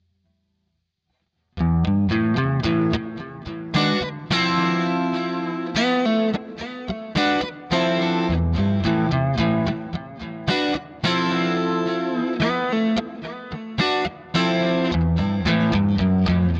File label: Blackstar St. James Lush Chorus Modded